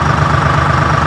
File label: tank idle